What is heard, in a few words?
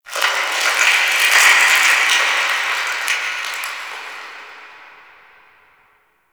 Ice Distant Ice Melt, Ambience; Warframe; Corpus Ice Planet